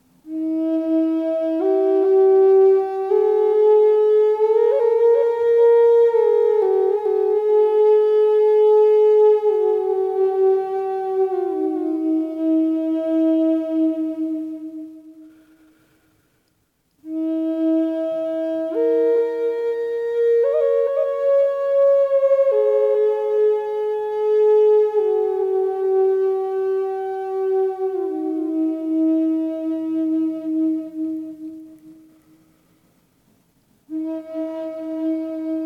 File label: E 440 delay, drone flute